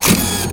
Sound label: rockets ammo; serioussam2